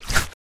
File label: color window; build mode